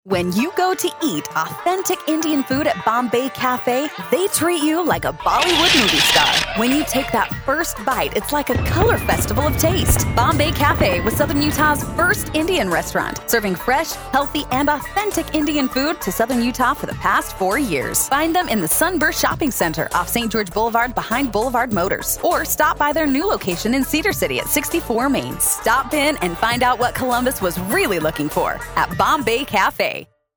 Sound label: Bollywood; Bombay Cafe